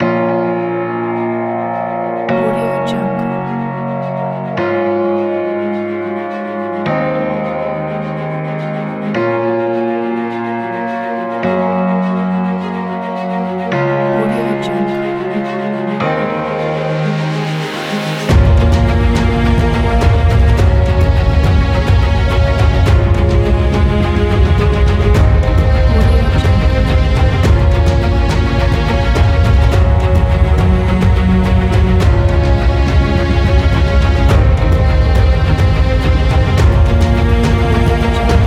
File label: cinematic melodic epic